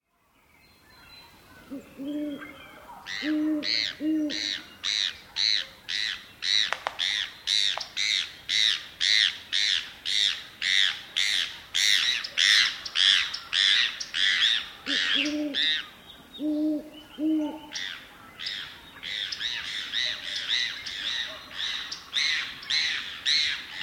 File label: mobbing owl; bird language